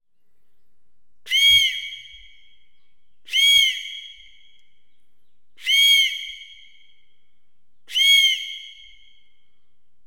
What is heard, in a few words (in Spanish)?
Silbato aguila reverb